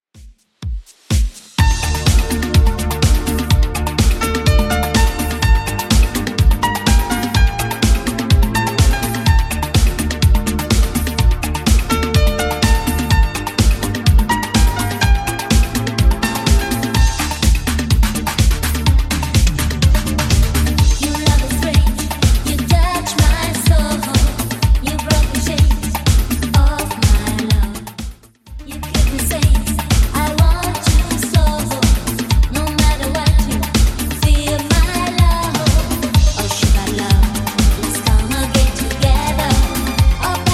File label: 80s Redrum 125bpm